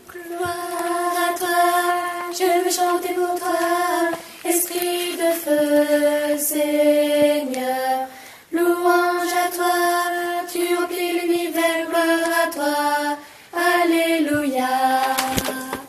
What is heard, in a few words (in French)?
voix du bas; Gloire a Toi Esprit de feu